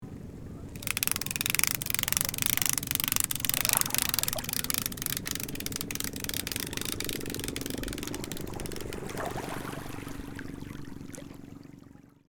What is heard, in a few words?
Boat portable trailer crank winch